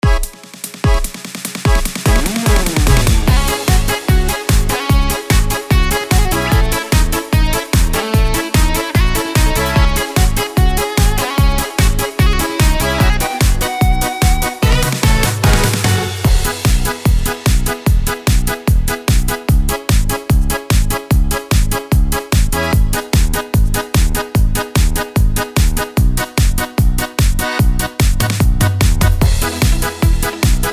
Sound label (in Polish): Dziewczyna z gor Style Yamaha; yamaha psr s670